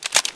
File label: mag out